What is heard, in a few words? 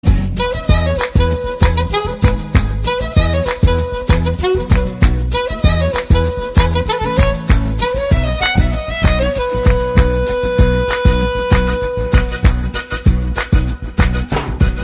Instrumental